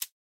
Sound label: button Up; netent